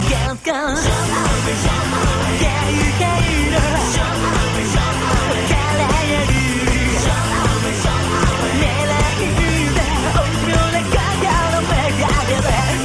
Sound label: Chorus